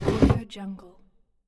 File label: heavy wood sliding into slot